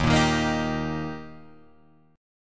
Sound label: D sharp Major D sharp Guitar Drop D, chord